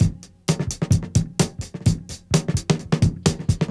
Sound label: Breakbeats